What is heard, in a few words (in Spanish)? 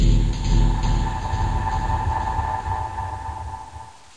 Sound Mysterio; tangram